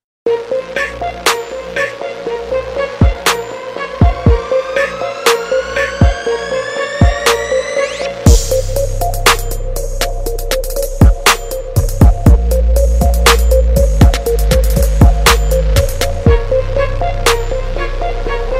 hip hop ringtone

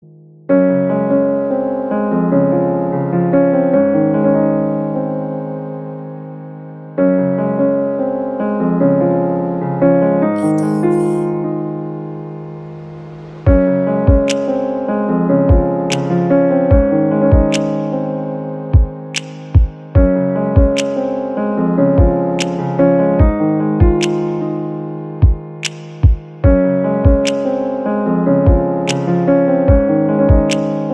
INSTRUMENTAL RINGTONE

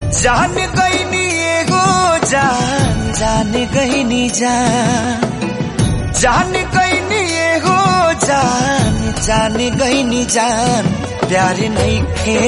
BHOJPURI RINGTONE

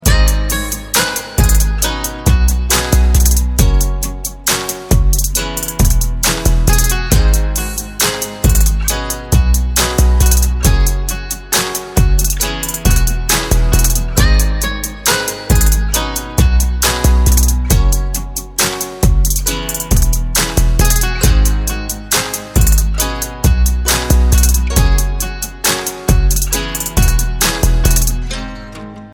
instrumental ringtone

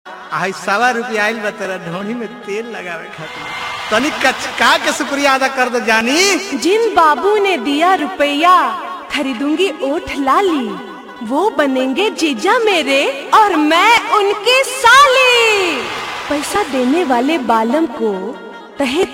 BHOJPURI RINGTONE

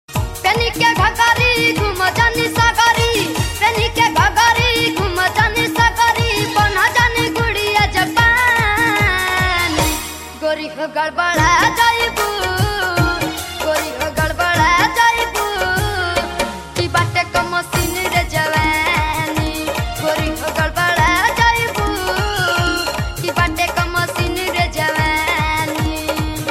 BHOJPURI RINGTONE

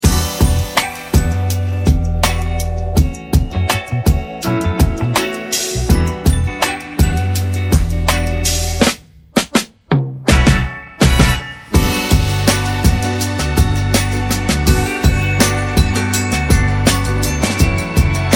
INSTRUMENTAL RINGTONE

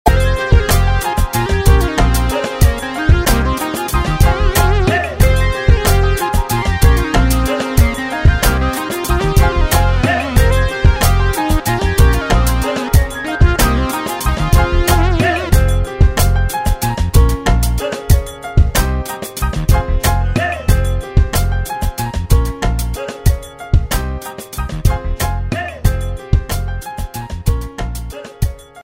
instrumental ringtone

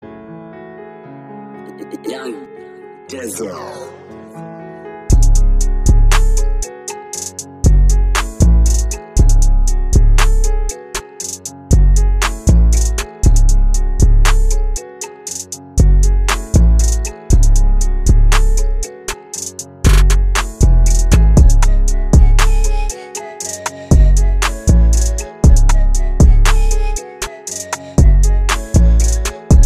TYPE BEAT RINGTONE

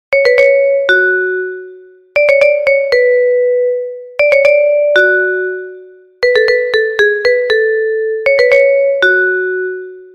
beats ringtone

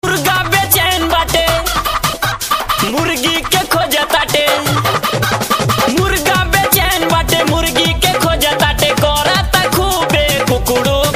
BHOJPURI RINGTONE

